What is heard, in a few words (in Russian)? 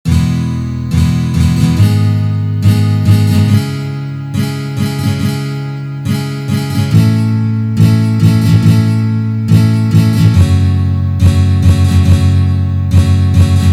boj na gitare